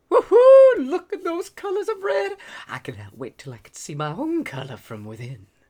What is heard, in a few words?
Male Reaction